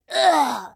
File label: Flyer Death; Col Flyer Death 04